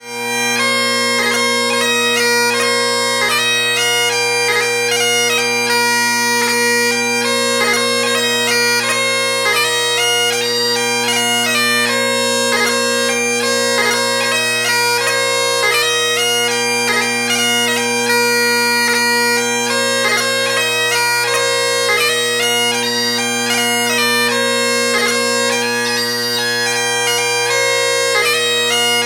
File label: Traditional Scottish